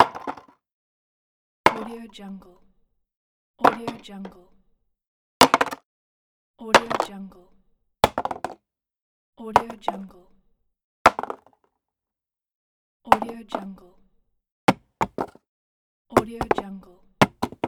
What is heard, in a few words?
drop cardboard box on various surfaces; lokohighman